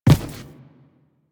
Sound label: Footstep Default